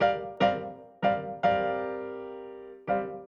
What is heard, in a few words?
Acoustic Piano